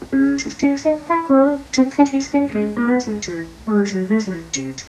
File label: news theme music urgent